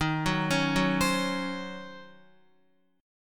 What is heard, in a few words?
Eb Minor 13th Eb down Guitar 4F,4A,5C,5G,5C,6E; Ebm13 arpeggiated; JGuitar